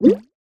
cartoon water drip or bubble burst; zapsplat

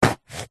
foley foam block dense stab sharp knife

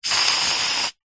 household aerosol deodorant medium spray; zapsplat

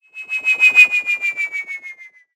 science fiction ufo pass fast cartoon like mono

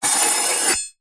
warfare knife metal blade edge scrape wood

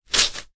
sponge or cloth wet soggy squeeze squelch; household

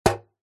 impacts metal small thin hollow bash knock single; zapsplat

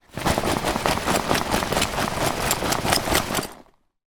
foley bag ruck sack shaking; zapsplat

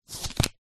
leisure playing card turn over on top of deck

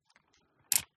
Hot shoe cap off; PMSFX

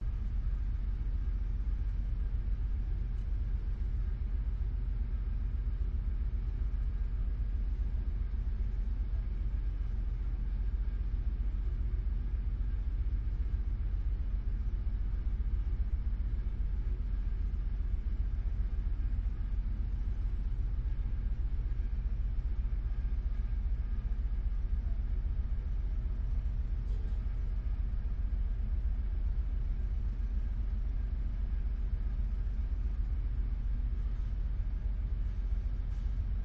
canadian ferry before departure on board; your free sounds

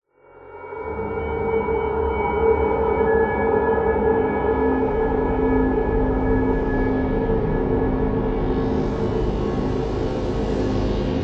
horror dark eerie sinister drone tension; zapsplat

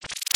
multimedia max min interface sound spinning max; sound effects one

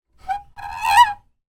wood squeak turn; foley; zapsplat

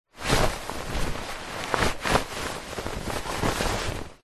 shirt handling; foley

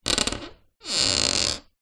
household door larder creak

